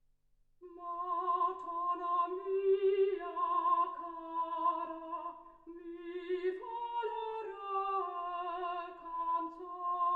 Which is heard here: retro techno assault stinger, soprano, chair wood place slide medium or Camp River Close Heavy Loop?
soprano